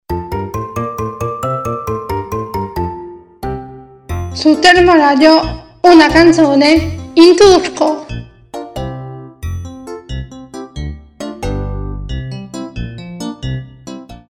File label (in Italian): Canzone in turco